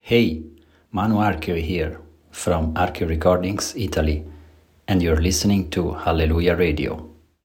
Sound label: Drop; Thankfulness; hallelujah